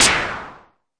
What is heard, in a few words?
mbrute noiz, Arturia MiniBrute